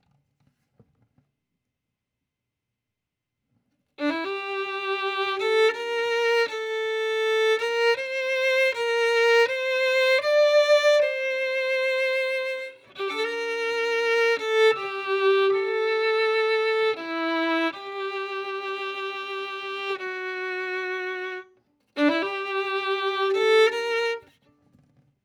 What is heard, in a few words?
Violin; Verdigris Music